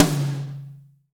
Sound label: NATURAL, NATURAL KIT; Spectrasonics; Burning Grooves CD2